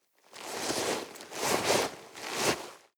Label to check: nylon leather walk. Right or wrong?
Right